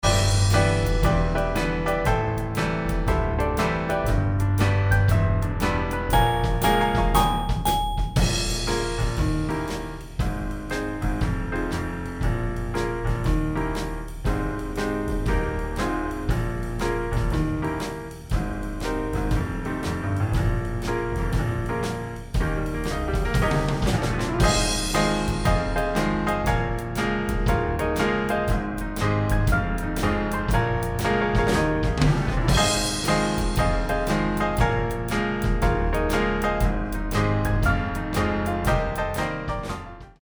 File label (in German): Playback As Dur Blasinstrumente